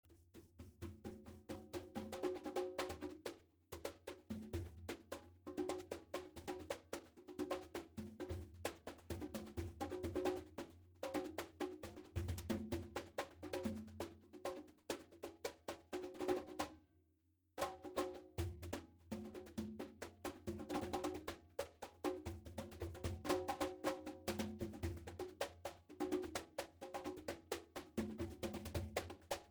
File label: CONGAS Residual Noise